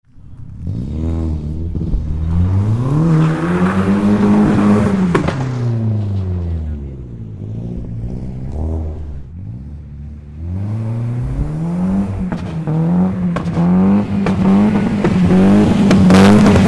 Boy Racer Burnout in Carpark on snow BMW exploding exhaust revving tyre; LOSTRACK